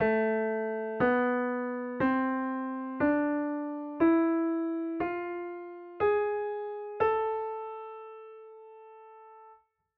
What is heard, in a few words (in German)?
harmonisch moll